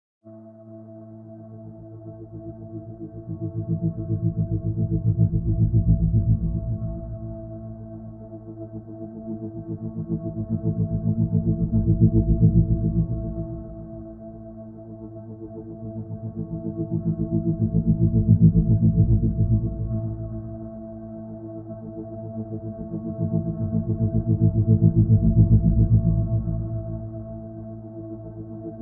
ambiance

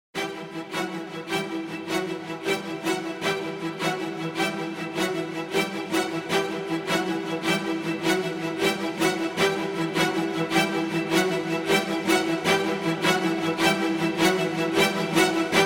orchestral

